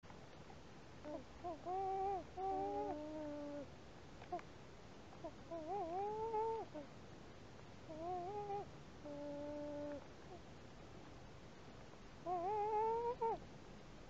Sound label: Loon Coos